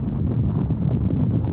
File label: oil pipe; Ambient; MX vs ATV Unleashed